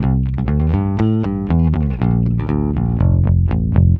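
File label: bass, plectrum, disco; Best Service ProSamples vol.48 - Disco Fever; AKAI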